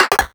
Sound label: 8Bit Sounds; Antidote